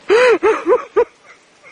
crying

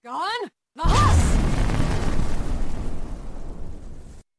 drain vitality female shout

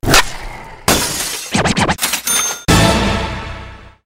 crash; thps2

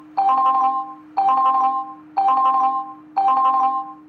wizz

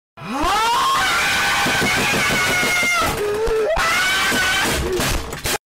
korean gamer scream

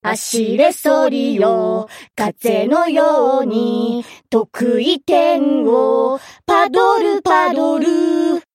combined sing; alter

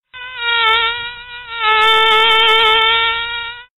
mosquito ringtone

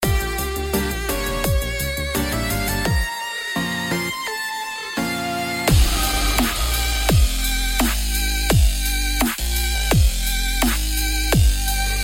fat plucks

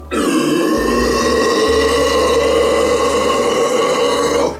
diet coke challenge no burp

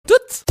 finger lick

